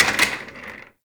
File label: Office Chair Impact